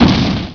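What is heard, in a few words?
explosion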